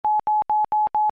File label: SystemMSG; LG B470; AIM